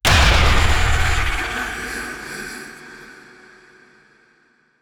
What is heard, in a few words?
Tension Builder Impact